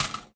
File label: Foley